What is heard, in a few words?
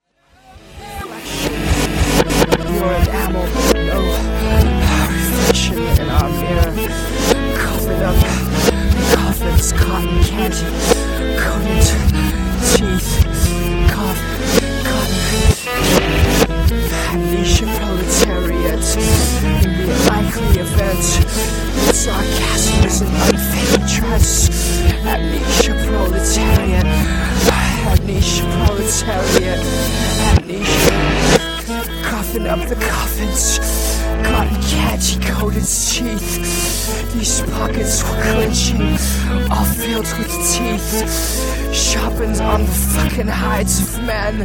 300 Mhz reverse